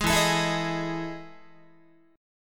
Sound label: E Major 11th E, Guitar; strummed; 11,12,12,14,12,14